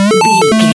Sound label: retro synth beeps